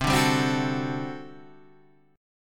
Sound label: G Major 7th Suspended 2nd Suspended 4th C